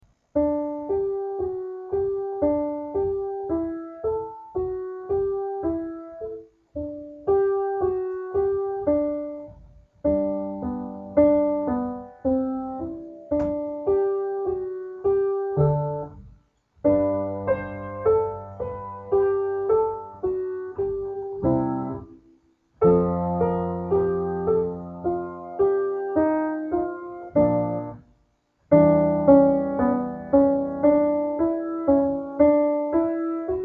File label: little prelude in g major, Keyboard; juvenilia